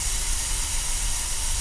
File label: Gas Leak Loop; JASEM: Just Another Shooter with Electronic Music